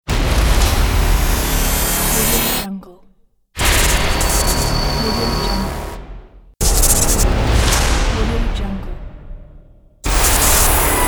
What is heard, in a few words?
transforming glitch with impact pack